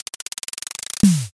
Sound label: drumroll